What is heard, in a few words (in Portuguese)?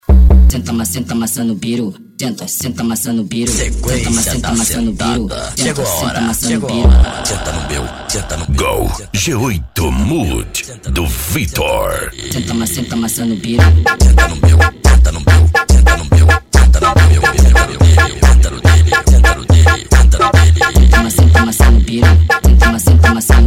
funk ritmado